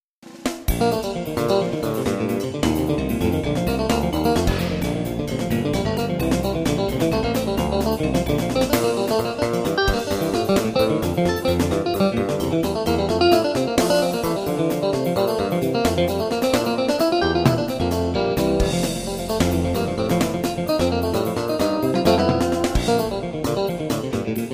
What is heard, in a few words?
Allegro in Eb Major; Kenneth Jonsson